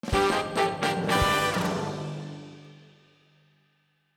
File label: Item Get; PAPER MARIO Color Splash; wiiu